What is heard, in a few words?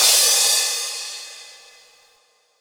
Crash, impact samples